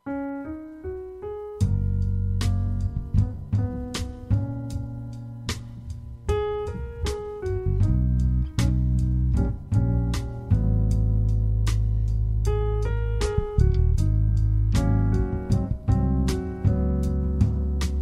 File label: jazz